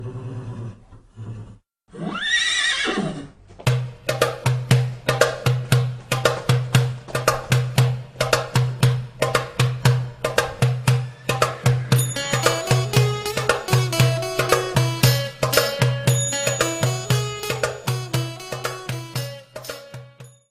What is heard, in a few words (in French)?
Hongrie